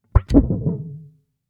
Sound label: Plastic Wobbling